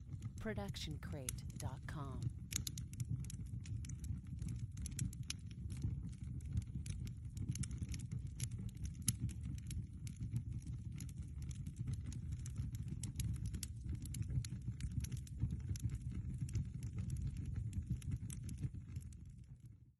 Outdoor Fire; SoundsCrate

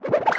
Wobbly Motion Design Riser

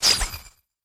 Anime Punch Synth Swirl

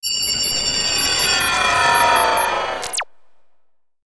SciFi Machinery; SoundsCrate

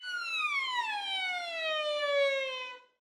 Violin Slide Down

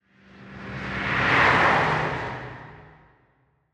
cars passing by

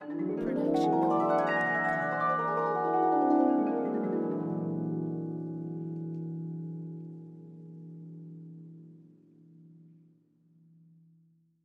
fantasy gliss; soundscrate

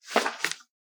page turns; soundscrate

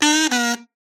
kazoo short fall; soundscrate

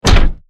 Robotic Footstep; SoundsCrate

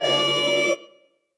robotic arm; soundscrate

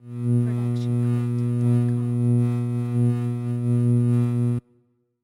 electricity hum medium; SoundsCrate

